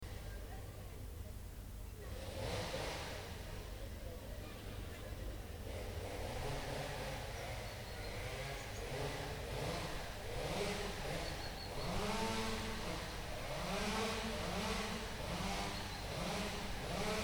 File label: chainsaw